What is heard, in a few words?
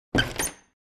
Door opening unlock